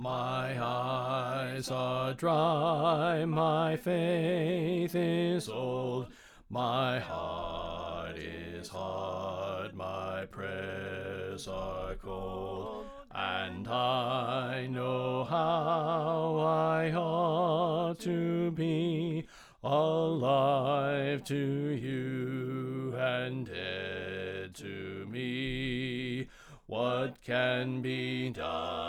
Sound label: Bass Left